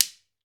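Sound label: GHANA shaker, African Perc; E-MU Formula 4000 Series Vol. 4 – Earth Tones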